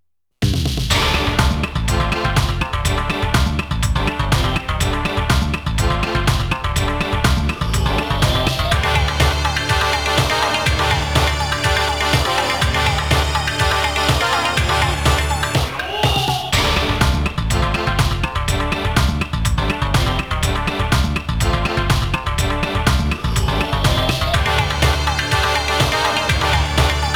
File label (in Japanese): ランキング BGM; konami amusement sounds 93 summer